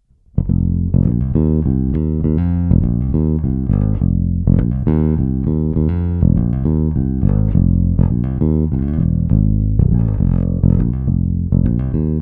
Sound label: Bass Only